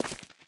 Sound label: heavy step; taunt; player; yeti standee; source engine; tf